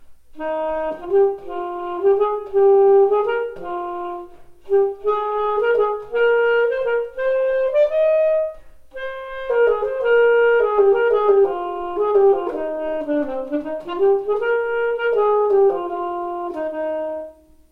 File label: alto